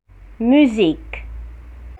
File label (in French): musique